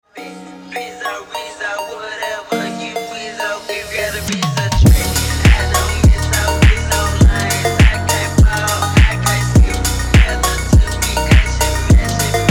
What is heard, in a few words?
Slowed; Ringtone